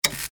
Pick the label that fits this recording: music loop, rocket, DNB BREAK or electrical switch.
electrical switch